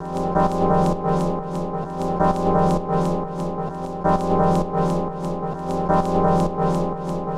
Epian Loop 130; Equipped Music; Loungin House

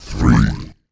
VOICE FX, Dance Vocals

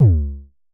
Kicks C#2; Vermona Kick Lancet; LookIMadeAThing Kicks; Festival Kicks; Drums

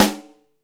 HIP HOP, SNARES; Back In Time Records; Big Bang

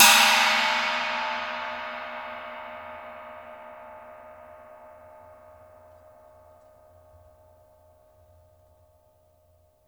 PANG STIC, cymbals; Sampleheads; New York City Drumworks VOL-1

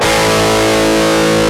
GUITAR, POWER GT

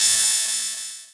Akai XR-20, Drum Machines, Cymbals

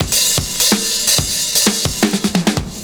CYMB, Breakbeats; USB Soundscan vol.46 - 70 s Breakbeats; AKAI

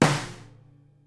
SNARE, Live Snares

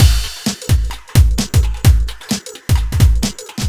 130 bpm full, drum loops; Zero-G; Soundclash Nu Dance Electro; Acidized Wavs; Dub Me Live

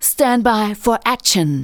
vox, Techno Trance; Ueberschall

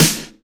Snares; Vengeance - Essential Clubsounds Vol.4

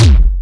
TOM BASS K, TECHNO RAVE; Pro-Rec - Synthsonic

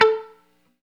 GTR X MUTE, Chunk Mute, Funk & Mute; 90's Sample CDs; Club-50 - Foundations Roland

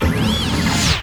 sfx sci fi; Masterbits - Switch FX

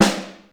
XE8 SNR, drum; Crypton - DrumBase5000

